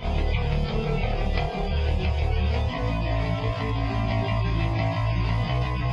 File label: Groove